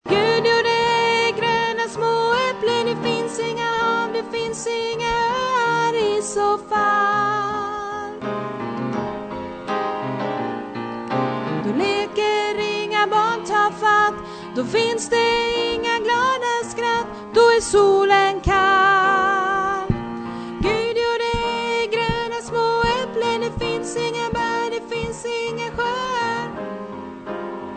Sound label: tenor